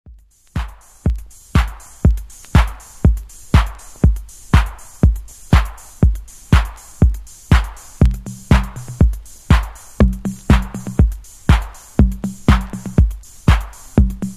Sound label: electronic drums